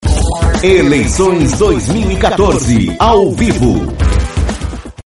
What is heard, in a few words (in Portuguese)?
vinheta